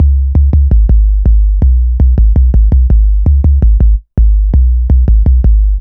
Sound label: Standard bass loop 165bpm C2, Drum&Bass; Total Drum&Bass